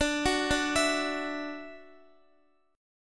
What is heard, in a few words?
D-Major Add 9th D down Guitar; Dadd9; arpeggiated